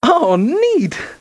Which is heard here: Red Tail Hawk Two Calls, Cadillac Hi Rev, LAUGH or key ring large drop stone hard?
LAUGH